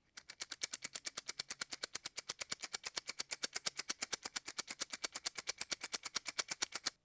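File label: toothpicks sound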